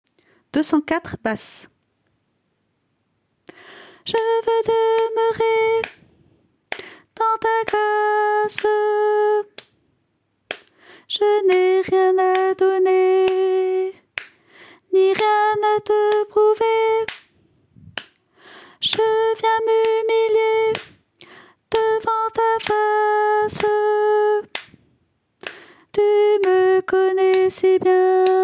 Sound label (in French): basse